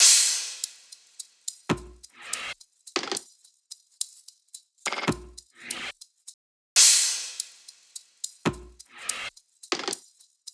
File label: loop perc 142; Seismic Loops